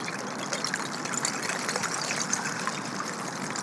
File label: Icy Stream, On The Glacier